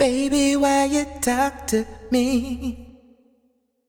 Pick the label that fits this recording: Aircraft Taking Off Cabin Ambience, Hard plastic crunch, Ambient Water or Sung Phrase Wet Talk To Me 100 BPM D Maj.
Sung Phrase Wet Talk To Me 100 BPM D Maj